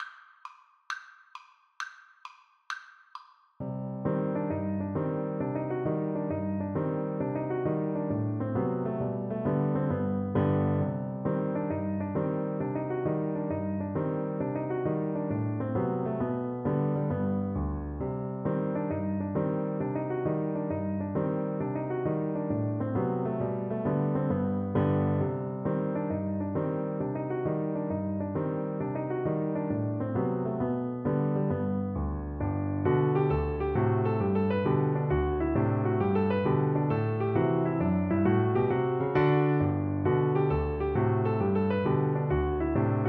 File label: Kolo, cello